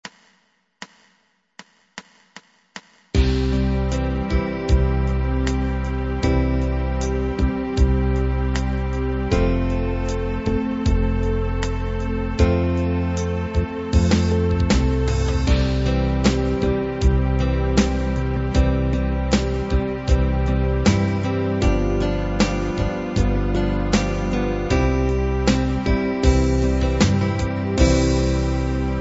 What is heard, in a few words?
expanded ballad